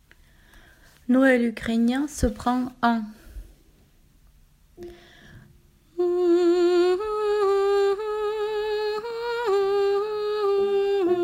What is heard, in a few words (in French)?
noel ukr pavochka sopranis 1; noel ukrainien pavochka